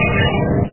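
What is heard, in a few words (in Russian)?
поломка и полное выключение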